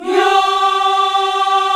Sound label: VOX, African Yas; Roland LCDP11 Africa VOL-1